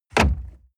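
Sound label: sports car interior glove compartment close medium; verkehr transport; autos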